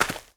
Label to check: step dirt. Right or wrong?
Right